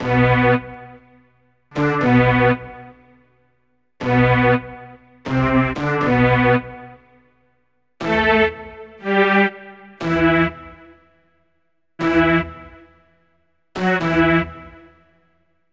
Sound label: Reverse Strings Stab; Sonic Foundry (Sony Creative Software); Club Joints and Street Anthems; Drop Top